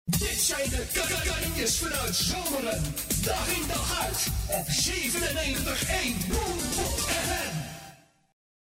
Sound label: Jingle; Bloempot Fm; Dit zijn de kanjers uit Someren